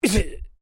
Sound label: MALE VOCALIZATION EEGH